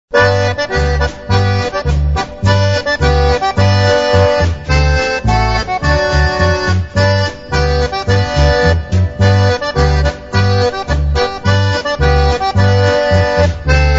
FARMERS POLKA

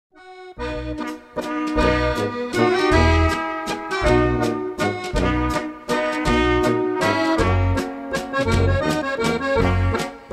Waltz

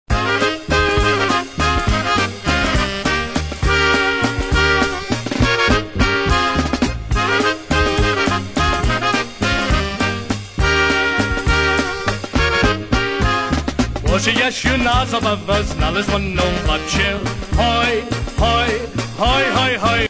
oberek

